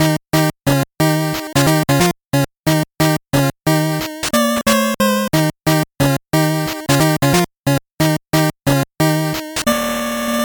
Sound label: 8bit bgm normal